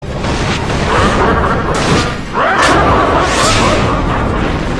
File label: Laugh; Condemnation